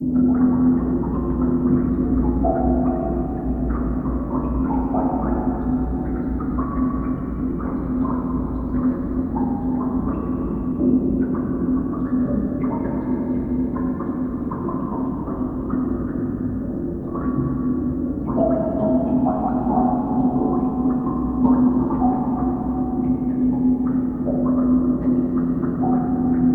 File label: Amb Canalisation Amb